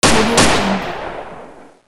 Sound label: double shot